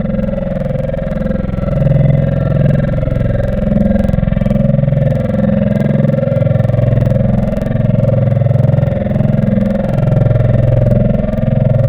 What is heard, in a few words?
Vocal Flight Ahh; Wavetables; Synthetic's Serum Collection; ESW Core Wavetables; Echo Sound Works Core Tables